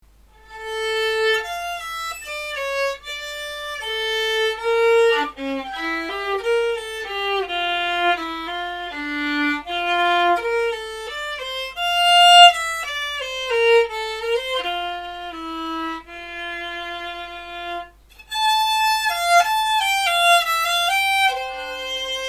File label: minuet, violin; adult violin